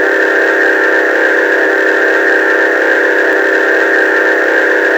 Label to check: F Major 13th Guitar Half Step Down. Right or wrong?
Wrong